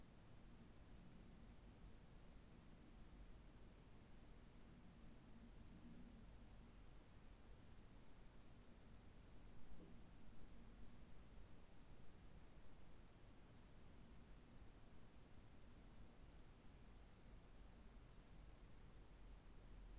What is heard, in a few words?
Rode NT SF1 4 Channels Self noise only; 4 Channels Matched Loudness 23LUFS; spatial microphone test; Atmosphaeres